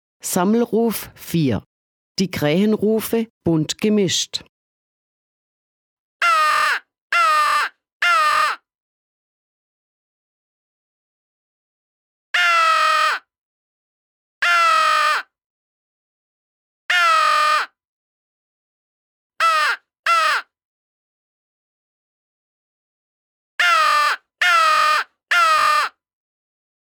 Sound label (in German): Sammelruf, Die Kraehenrufe gemischt